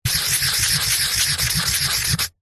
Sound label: office marker pen on cardboard fast scribbling circles